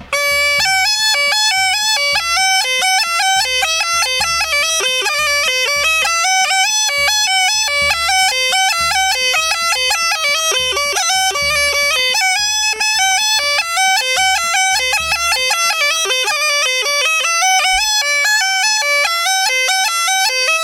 Chetvorno Line, Small Gajda

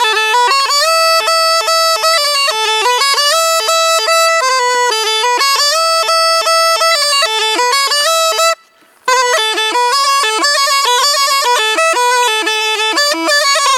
Ruchenitsa, Gajda; Lesson Recordings; Petar Usmivkata Aleksiev

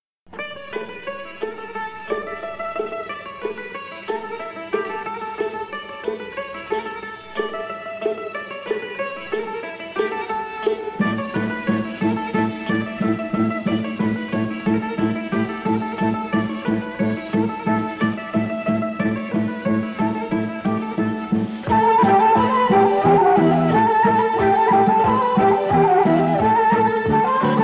Bulgaria Varnenska Tropanka, Folk Dances; Petaluma; 1st String Folk Dances; Bulgarian

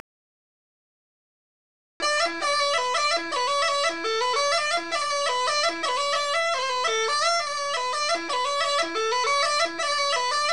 Ruchenitsa Y Line#3, Gajda; Lesson Recordings; Vasil Bebelekov (2008) Gajda Lesson

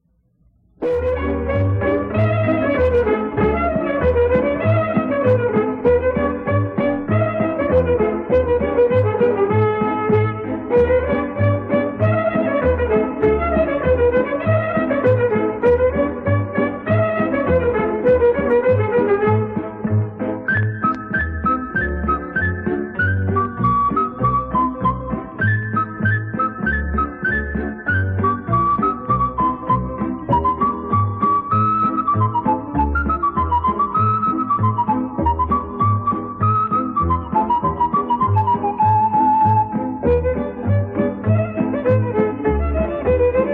Romania Promoroaca, Folk Dances; Petaluma; 2nd String Folk Dances; Romanian

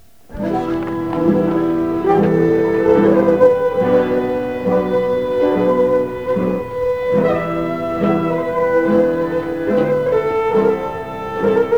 Devoiko Mari with tambura, Gadulka; Lesson Recordings; Nikolay Kolev - Gadulka Lessons

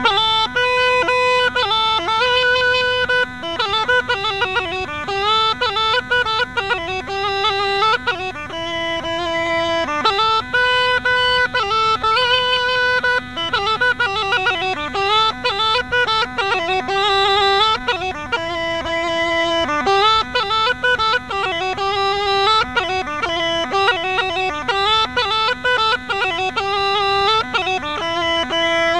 song, Rodope Gajada

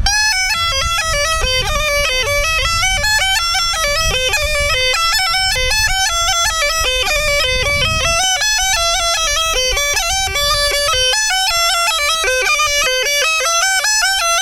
Chetvorno Line 3B, Small Gajda

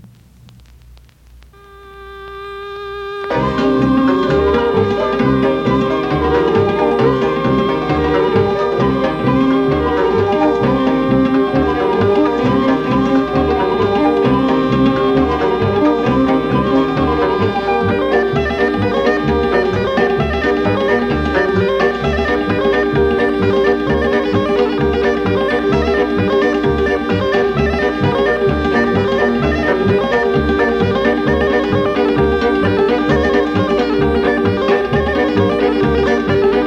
Romania Hora de Mina, Folk Dances; Petaluma; 1st String Folk Dances; Romanian

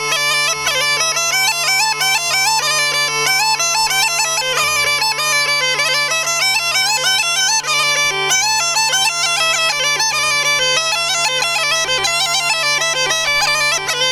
Nestinarsko, Small Gajda

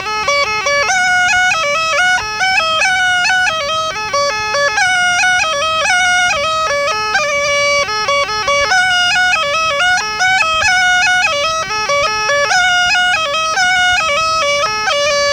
Varnenski 9 melody major, Small Gajda

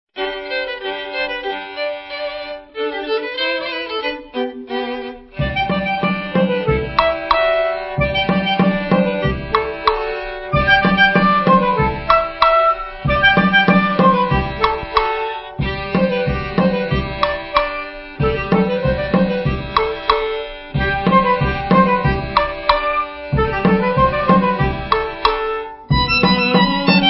Bulgaria Tropanka Stampdans, Folk Dances; Petaluma; 2nd String Folk Dances

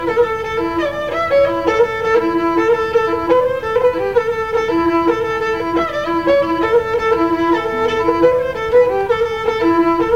Pandalash line#6, Gadulka; Lesson Recordings; Nikolay Kolev - Gadulka Lessons

